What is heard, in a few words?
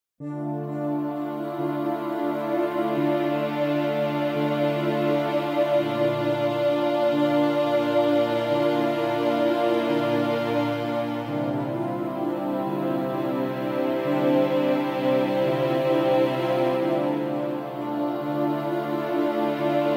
Book Music